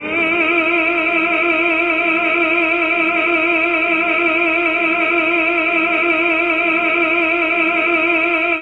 tenor opera note, MALE SINGING

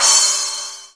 80s CRASH, 80s Drum Machine; DRUM MACHINES

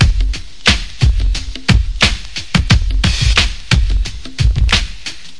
089 bpm hard hitta, DRUM LOOPS and BREAKS

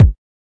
kik; Kicks; single hits

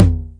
909 Mid Tom; DRUM MACHINES; 909 Extended

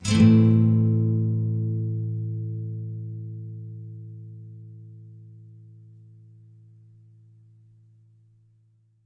Nylon Chord A min, Guitar; Nylon Guitar Chords

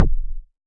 click simple, Kicks; single hits

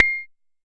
Jomox MBrane, drum machines; Jomox MBrane 11

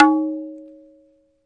hard tabla Open Na; ETHNIC and WORLD PERCUSSION; Tabla Kit Hard

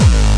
obnoxious kick; Kicks; single hits

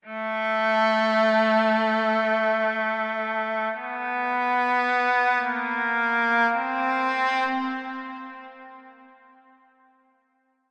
dissonant cello melody; ORCHESTRAL and CLASSICAL

